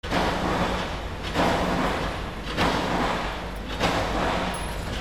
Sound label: INDUSTRY